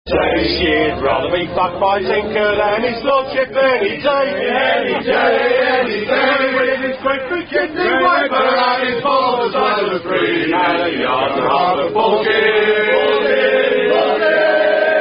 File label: Rugby Song; Great Big Kidney Wiper; Highland Tinker